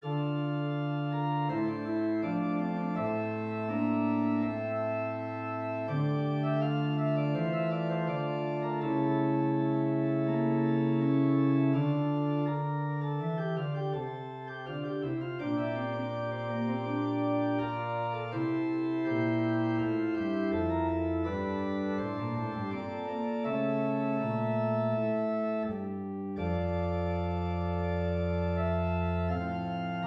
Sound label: Organ prelude in d; computer-music